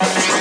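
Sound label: electric shock; Virtual Computer Pets; cartoonz!; charleycatviii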